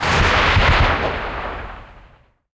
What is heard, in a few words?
12mm tails far